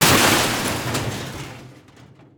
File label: metal debris